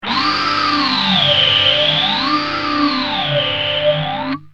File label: gtr